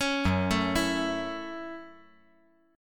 F sharp Minor 7th F sharp; arpeggiated; JGuitar

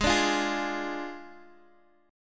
F Minor 6th G sharp

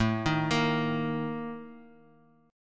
Bb Major 7th Suspended 4th

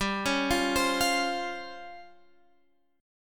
C sharp Major 7th Flat 5th G

